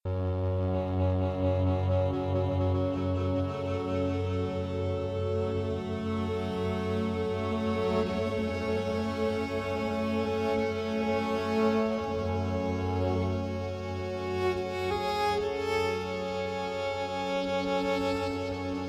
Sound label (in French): grain, flute a bec